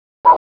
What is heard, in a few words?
blip move; spacehulk